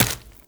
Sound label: Run Flesh, Footsteps; Warframe; Tenno